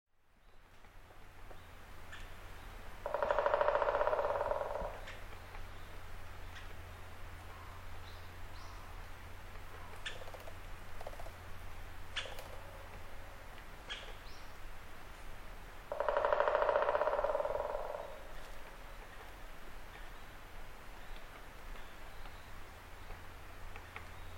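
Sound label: White-backed Woodpecker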